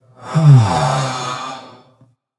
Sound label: nv breath; smite; xbalanque